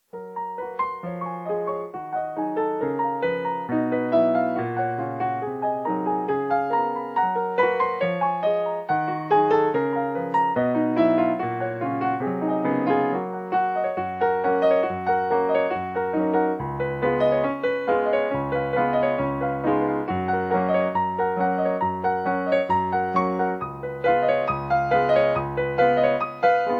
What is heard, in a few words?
medley practice